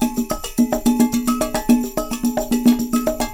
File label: Percussion, 144; Sampleheads; NYC Percussionworks; 90 s Sample CDs